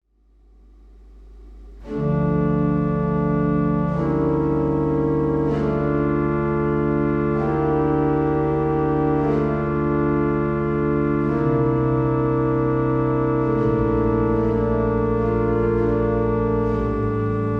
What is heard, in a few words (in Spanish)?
flautado, órgano